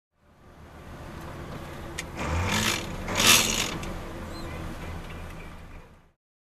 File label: Garbage Truck